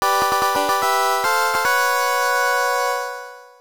Bonus Round Fanfare; Mappy; PC-8801

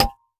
Candy Fall; Candy Crush Soda

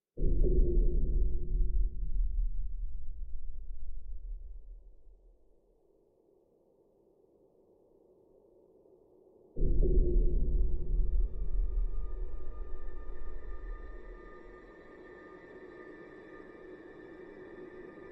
Infection Ambience; Criminality

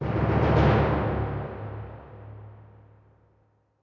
Creep Tymp

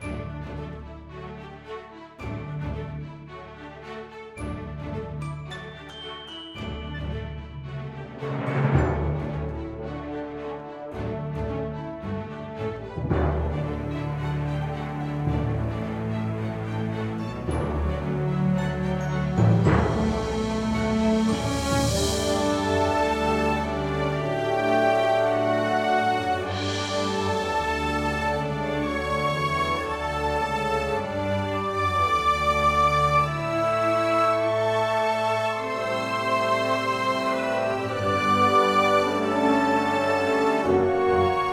Game Music; frozen free fall snowball fight 2015 ps4

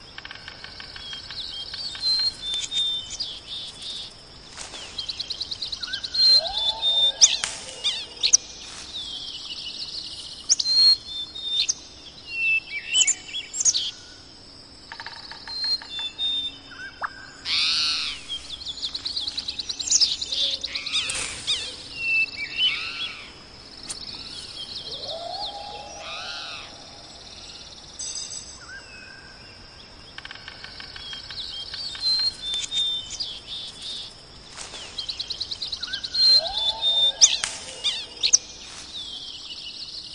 Ambient The Woods of Light; rayman 2

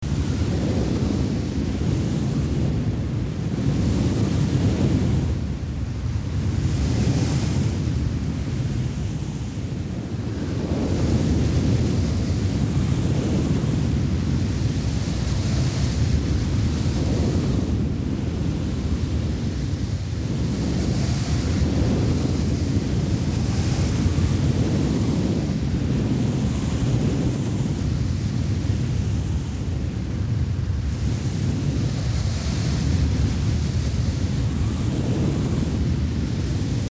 Ambient Wind - Heavy Loop

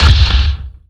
Fence Short; Nicktoons Nitro; arcade

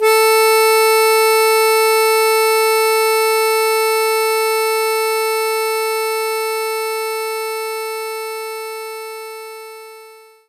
Harmonica A3; Knights Try

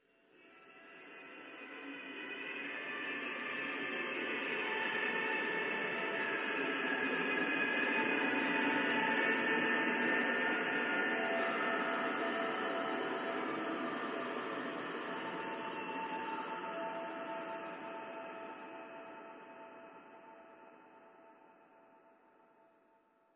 Music Atmo; gamerip; Ballance

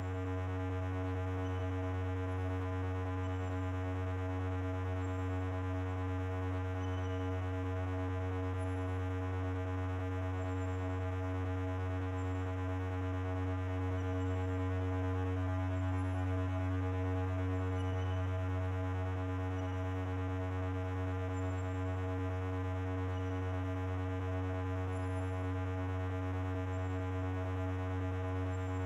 Music Drone; Battlefield 3

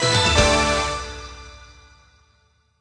Gacha Jingle

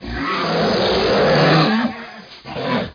Zombie Growl

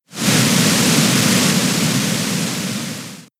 Rain Dance; attack moves; Pokemon; gen 7; SUMO; USUM; Rain Dance part 2

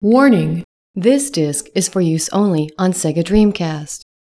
Warning; Mortal Kombat Gold

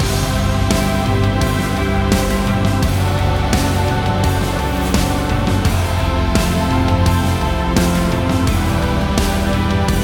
BGM Kowloon Street; wanted dead ps4 ps5 windows xbox one xbox series xs gamerip 2023

